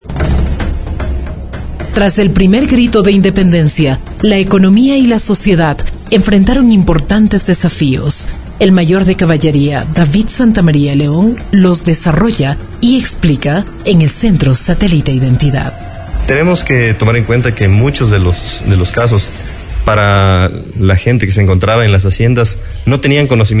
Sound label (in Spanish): grito; TUNGURAHUA